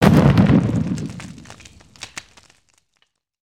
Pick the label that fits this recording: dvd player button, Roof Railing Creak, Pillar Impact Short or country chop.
Pillar Impact Short